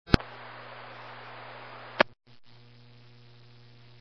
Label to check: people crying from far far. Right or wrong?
Wrong